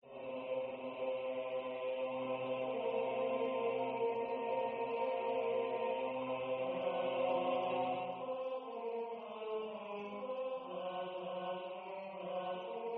mixed choir

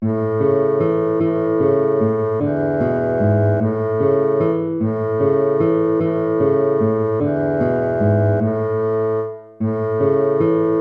waltz; Carulli Ferdinando

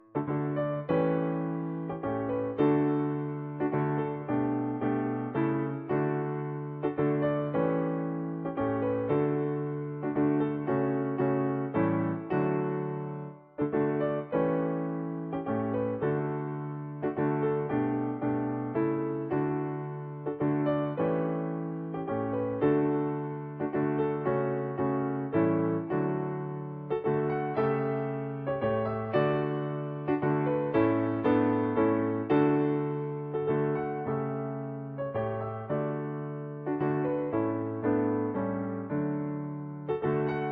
german dance; schubert franz peter

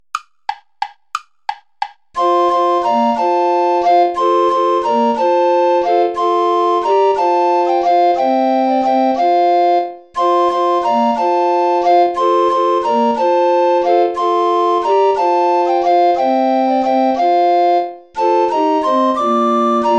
play along without soprano recorder; gastoldi giovanni giacomo; dir ist freude

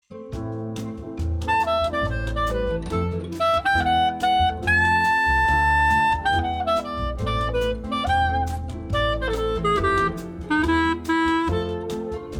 bossa

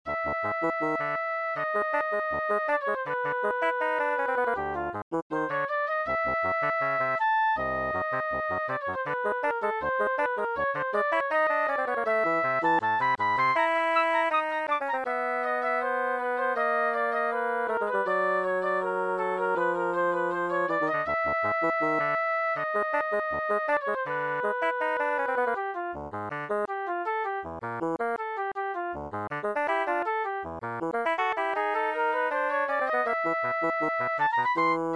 for basson and flute agitato; 4 miniatures

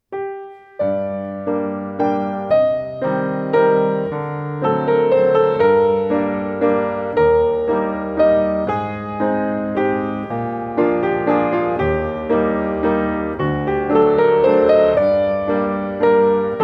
piano solo; guido gaetano; piccolo valzer

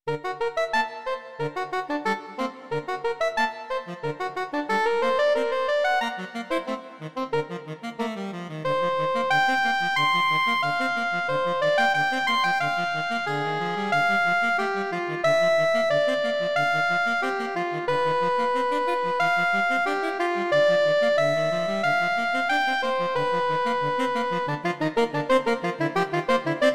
bagatelles for two saxophones presto

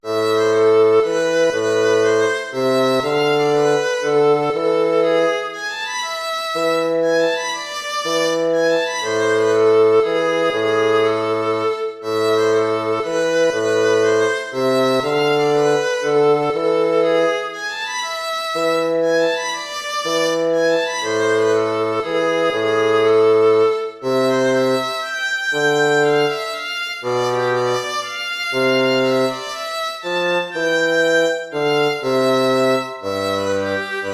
baroque minuet minor

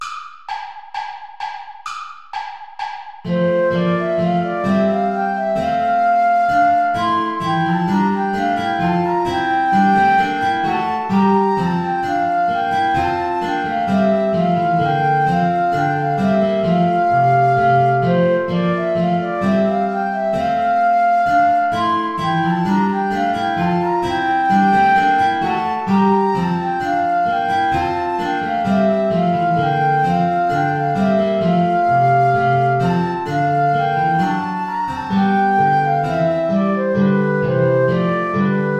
play along without guitar; praetorius michael ballet des feus

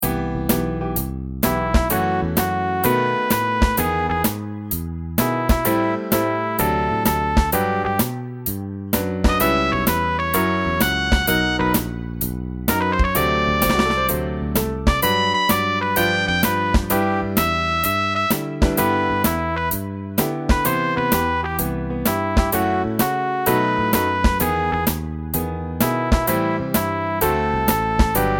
trumpet ballad

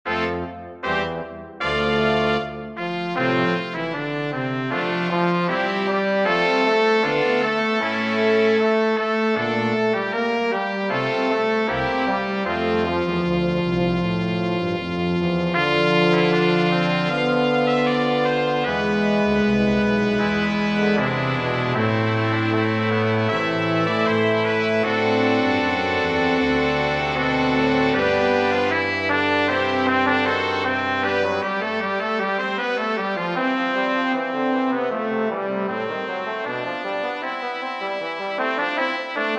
for brass sextet; markull friedrich wilhelm; nun lobe meine seele

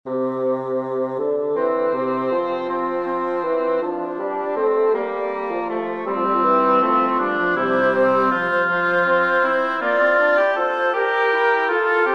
canzona for woodwind quartet; goudimel claude; gloria excelsis

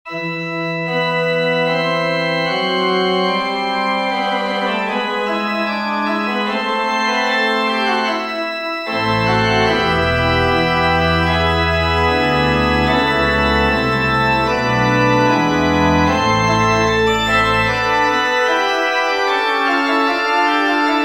choral prelude, pipe organ; Buxtehude, Dieterich; Mensch willt leben seliglich

